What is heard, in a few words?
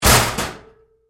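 Door Locker Close Close Up Hard, Metal Door; Doors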